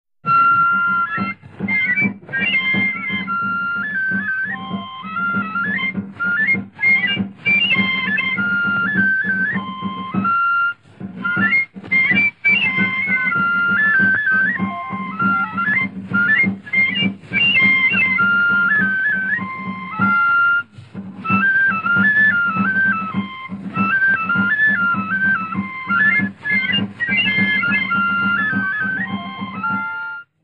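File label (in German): Historische Flötentöne Schützenmarsch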